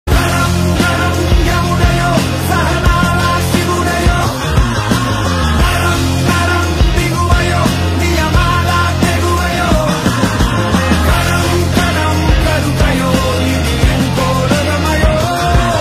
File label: telugu ringtone; saripodha sanivaaram